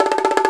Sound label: Bongo Hi Roll; Digital Sound Factory Virtuoso